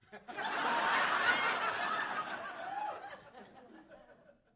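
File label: Crowd 50 Single Hearty, small interior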